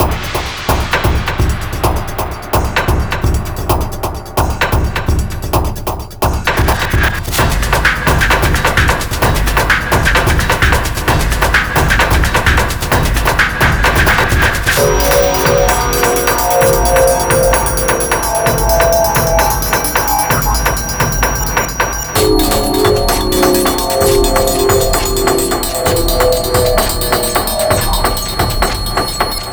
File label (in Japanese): イベント 急襲; Touhou Soujinengi V; PS Vita